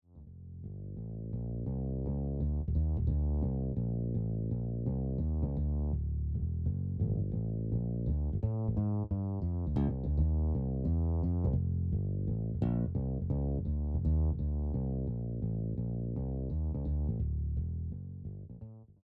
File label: bass dry